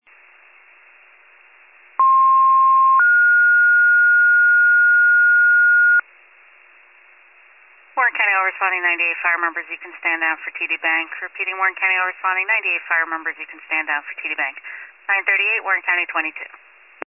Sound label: FIRE pager alert